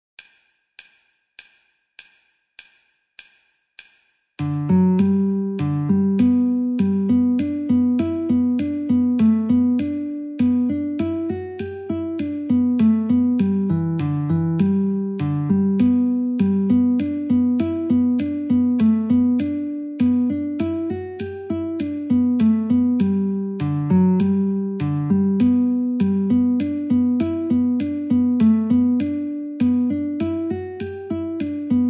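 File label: Guitar metronome 50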